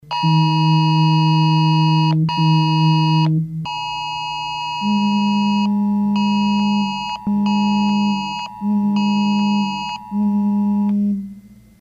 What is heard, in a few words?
alert tone for test